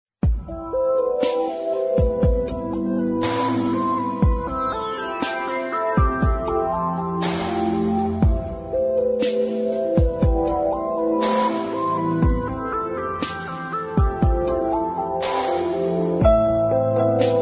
new age

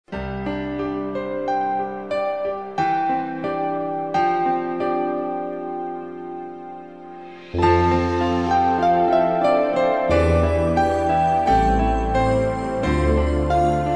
newage

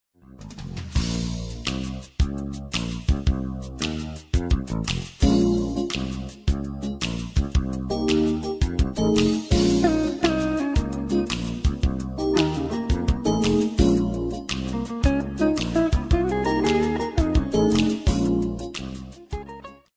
jazz lounge

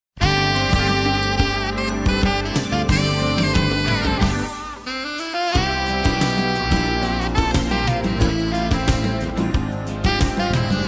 jazz pop

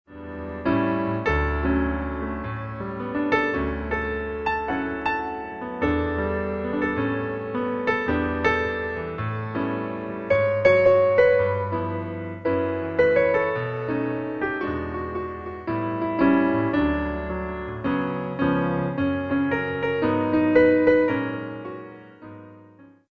piano

